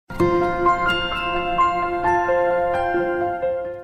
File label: Notification